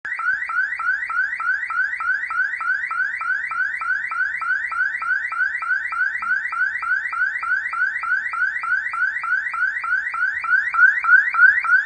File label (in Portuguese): sirene alta potencia